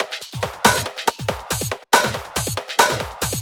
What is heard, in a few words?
Loops 140 BPM Hihat Percussion Loops